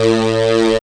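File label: synth tone; E-Lab; X Static Goldmine 1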